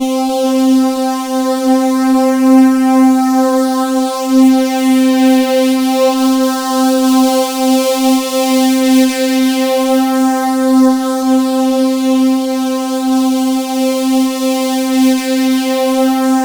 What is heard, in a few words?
C3, Synth Line